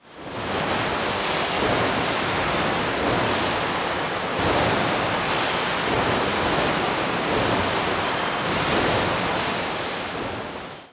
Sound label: Hall ambient